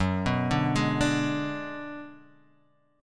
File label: D 6th Flat 5th F sharp